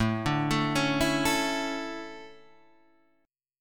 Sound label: D Major 7th Suspended 2nd